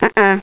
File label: uh uh